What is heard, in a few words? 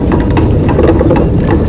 wheel, plats; quakemapping; mod xj18; kinn